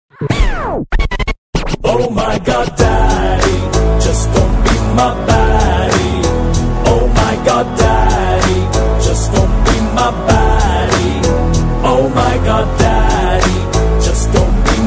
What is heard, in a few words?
song ringtone; alla vikuntapuram loo